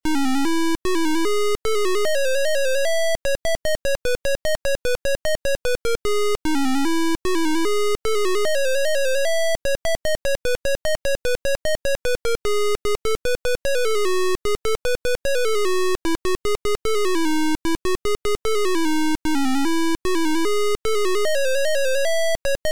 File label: MELODY